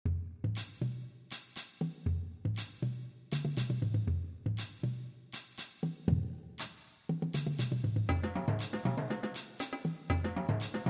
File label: Jersey Beat